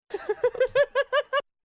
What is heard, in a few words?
Gay Laugh; BaD Radio